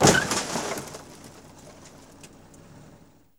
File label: TRUCK JUN, Transportation, Truck Junk